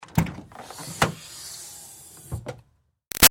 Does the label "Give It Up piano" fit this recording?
No